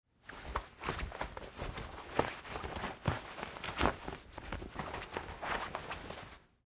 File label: Foley, Fight Moves, Pillow Case, Struggling; Wrestling And Struggling